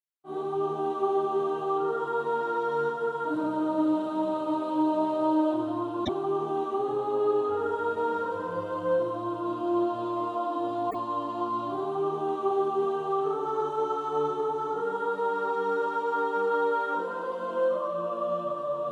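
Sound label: soprano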